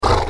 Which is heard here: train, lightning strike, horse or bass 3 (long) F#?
horse